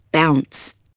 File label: bounce